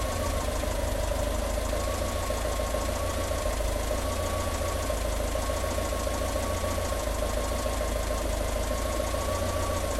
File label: Engine Idling